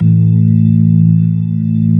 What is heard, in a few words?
mid slow, ToneWheel Organ; E-MU Formula 4000 Series Vol. 3; Analog Odyssey